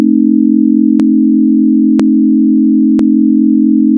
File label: 567 buzz test